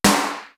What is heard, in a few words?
ALLOY, TOM; East West - Global Sounds; D4 BD SD TOM